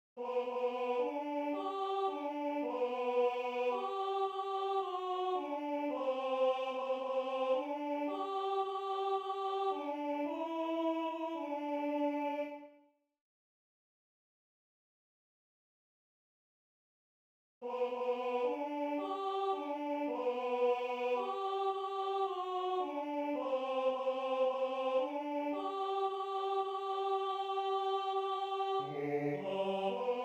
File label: Tenor I; I schwing hin